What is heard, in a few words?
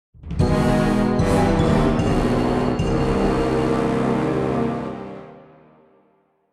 Jingle Descent